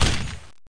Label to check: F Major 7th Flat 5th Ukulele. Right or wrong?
Wrong